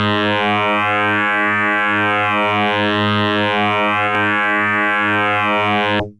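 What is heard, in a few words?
Electric Bass DX100 G#5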